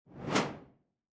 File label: swoosh sharp hit